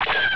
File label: BLAST, Gunshots; sound effect